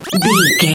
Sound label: retro boost power